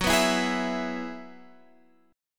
Gb 7th Suspended 2nd Suspended 4th, Guitar Standard; strummed; x,9,9,9,9,12; JGuitar